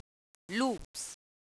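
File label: loops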